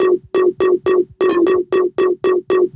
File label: Stab Loops; neuro; Optiv & BTK